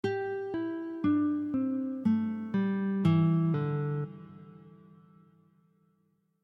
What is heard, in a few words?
pull, guitare